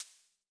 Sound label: Korg open